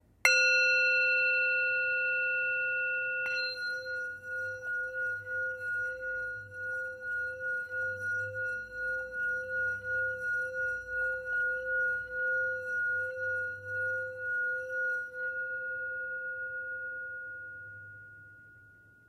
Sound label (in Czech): tibetska misa; saga mala